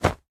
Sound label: Weapon Cloth